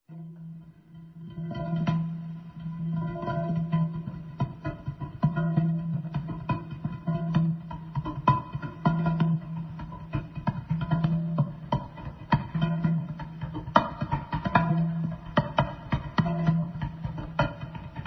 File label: drums; metal raine